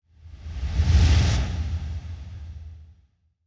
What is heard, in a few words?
woosh long